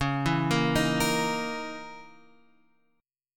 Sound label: C sharp Minor 6th Add 9th; x,x,x,6,4,5,5,7